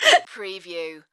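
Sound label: Female Gasp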